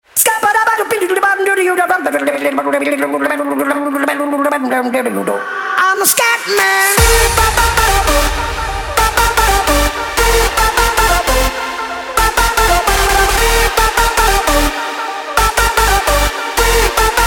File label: BEAT